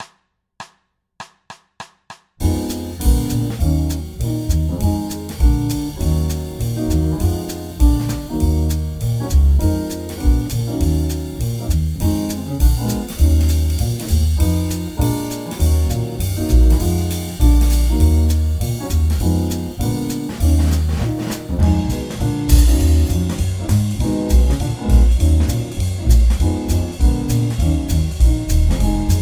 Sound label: Fm7b5 Bb7 Ebm7 (2bars Loop) 200